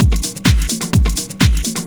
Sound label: Latin Loop 128 BPM